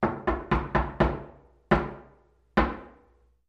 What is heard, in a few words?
Door Knocks Wood